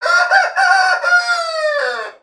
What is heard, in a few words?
crow; creatures organic